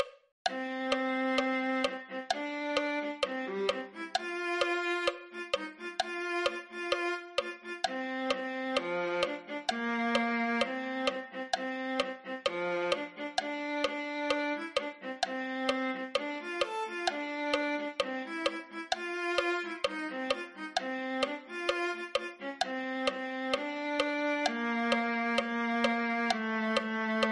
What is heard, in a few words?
viola